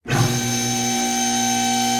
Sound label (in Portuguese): guinchando; deathmatch